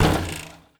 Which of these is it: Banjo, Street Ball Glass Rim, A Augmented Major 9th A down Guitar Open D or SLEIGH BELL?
Street Ball Glass Rim